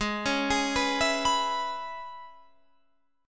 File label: Db Minor 7th Ab, chord